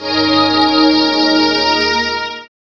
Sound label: SYN CHD